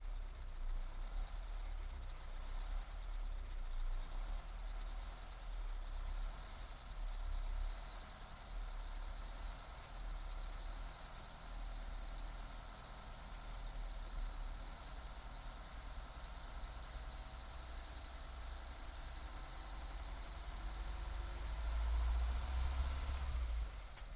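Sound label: Peterbilt 359 onboard medium drive various engine left; beta 52; Sonniss GDC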